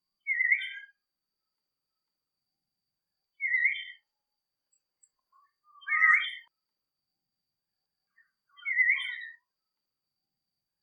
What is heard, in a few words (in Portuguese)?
Canto do loris bailarino